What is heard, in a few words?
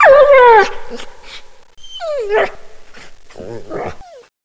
zombie dog death